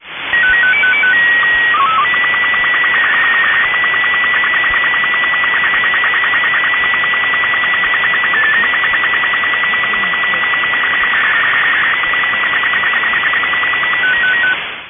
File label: rx sstv, optical comms; swasey